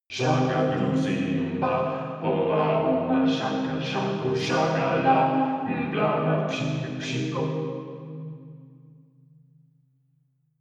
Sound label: vocoder vocals